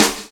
clicky snare drum sound c sharp key

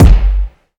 bumpin kickdrum sound g key

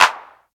clap sound b key

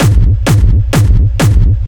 techno hard punchy kick distorted; drum loops

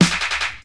classic piercing snare sample f key; snare-drum-samples

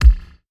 warm sounding steel kick drum sample g sharp key

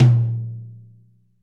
wet tom one shot a sharp key; tom drum

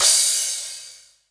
long urban cymbal sample g key

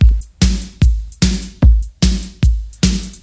149 bpm drum loop A key

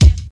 90s dry kick drum single hit d sharp key

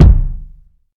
low kick A key

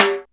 good tome drum sample g key; tom drum samples

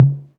clear tom drum sound c sharp key

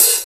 nice open high hat sample C key

